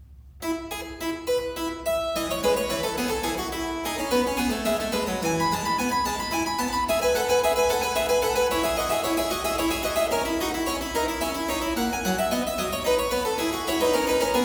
Zweistimmige Inventionen in F major, Keyboard Works; BWV 779; J.S. Bach; Inventionen & Sinfonias